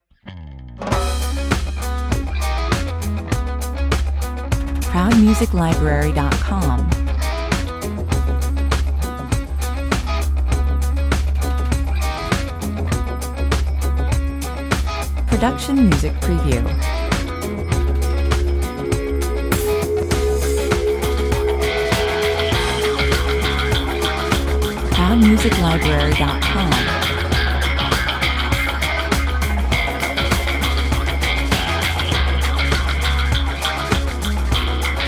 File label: muscle car 100 bpm